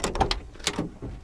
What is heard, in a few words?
door op; Mafie 1